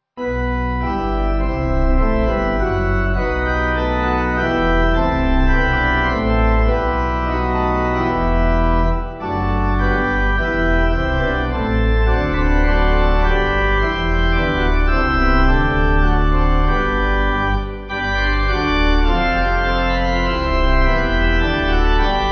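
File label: Pipe Organ, Music Backing Files for Churches; From Glory; St Keverne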